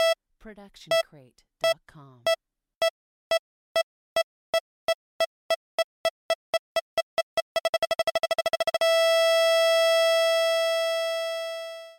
Bomb Beeps Increasing

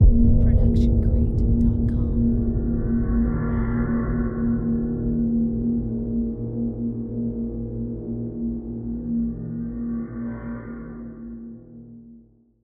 Suspenseful Ident; soundscrate

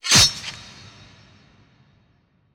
sword stab; soundscrate

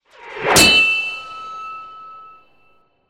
sword hits; soundscrate

